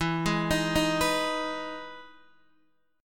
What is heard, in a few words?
E Major 7th E down Guitar DADGAD